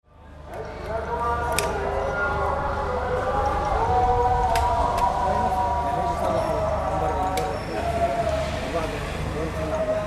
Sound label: ambient prayers; syria street